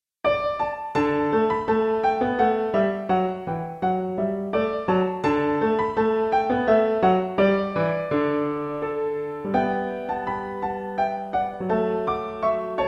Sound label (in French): Violon et Piano